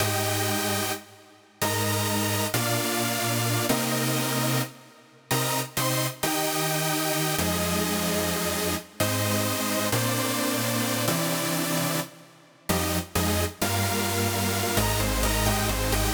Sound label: Main Synths (No Sidechain)